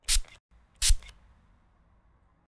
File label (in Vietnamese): Tieng phun suong